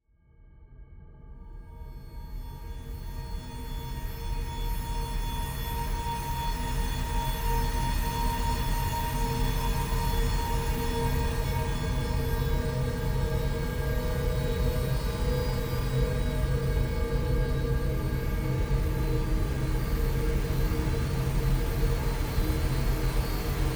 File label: Death